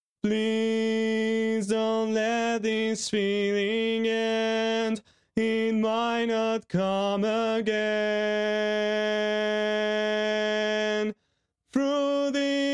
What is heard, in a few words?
Bari, barbershop tags